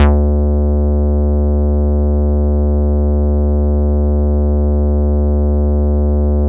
bass, synths

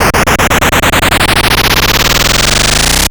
Gun FX; Graz

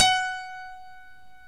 GRAND PIANO; OMI - Universe of Sounds

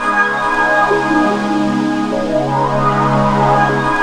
VINYL ST PAD

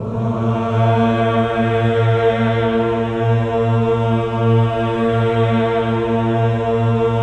VOWEL

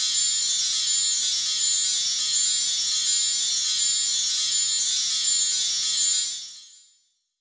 Wind Chimes; Roland BK-5

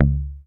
HOFNER MUTE, Bass

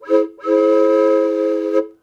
Train Whistle, cartoon Foley Fx; musicradar; cartoon caper

